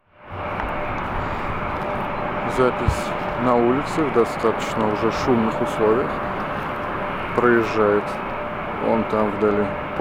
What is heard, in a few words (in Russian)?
ulica poezd; на улице, шумно, поезд; компактный микрофон для записи видео; Sennheiser MKE 200